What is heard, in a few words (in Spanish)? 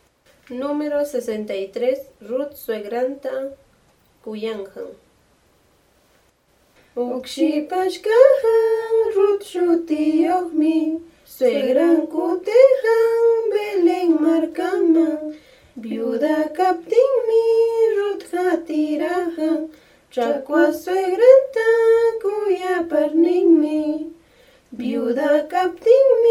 cancion